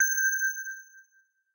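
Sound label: Effect Crystal ball break; Shalnor Silverwind Saga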